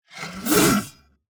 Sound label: succubus strike; Shelter